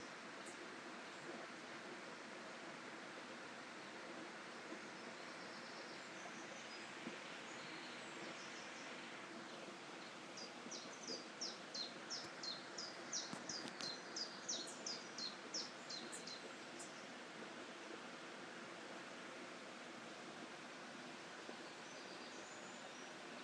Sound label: dartmoor stream water birds mid morning